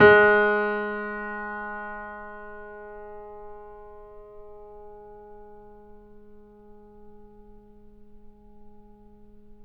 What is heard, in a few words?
Mp G#2, Grand Piano; AKAI S6000 CD-ROM - Volume 2; Grand Piano 256M